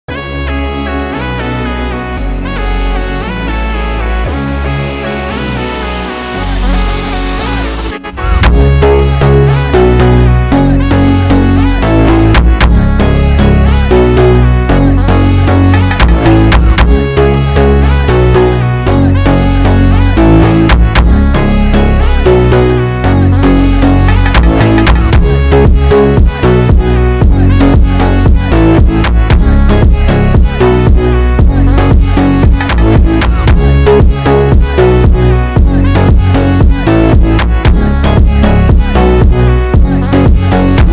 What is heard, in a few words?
Brazilian Phonk Remix